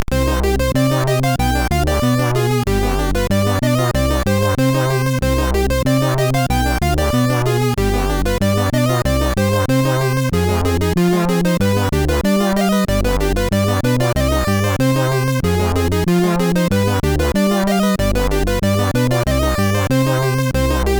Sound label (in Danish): Klassisk, SIDTunes; Worktunes